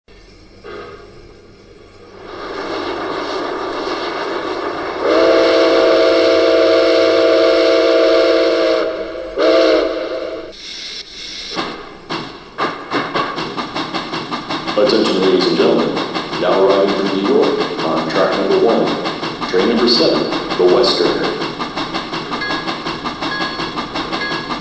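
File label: Berkshire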